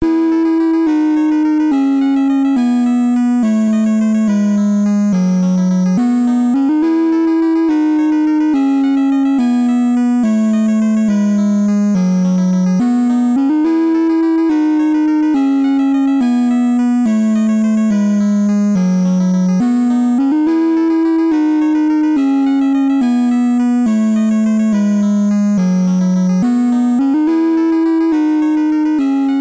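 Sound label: Celestial Waltz; Romancia; NES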